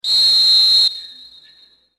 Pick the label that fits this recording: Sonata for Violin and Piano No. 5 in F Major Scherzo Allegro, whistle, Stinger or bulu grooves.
whistle